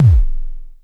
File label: KICK PTCH, Dual Percussion Synthesiser; Klone